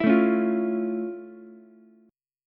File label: F Augmented Major 7th F Ukulele; F+M7; strummed